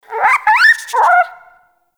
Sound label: Rabbit Ambient Call; Warframe; Duviri